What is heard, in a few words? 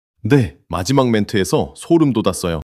surprise